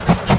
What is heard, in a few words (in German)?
dampflock Fast; helpTheTrain